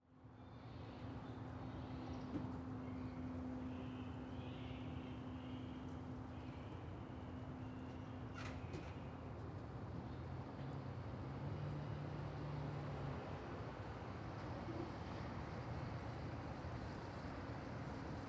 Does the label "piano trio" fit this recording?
No